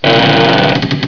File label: door